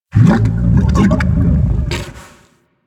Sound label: Helminth Idle Stomach Rumble; Warframe; Hub; Liset Alchemy Lab